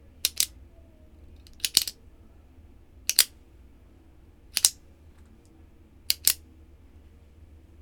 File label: music stationary